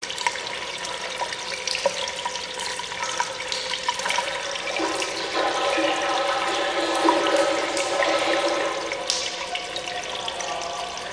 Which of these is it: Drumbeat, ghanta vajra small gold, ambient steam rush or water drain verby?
water drain verby